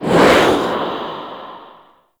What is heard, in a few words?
Aftertouch Speed Up; Warframe; Ranger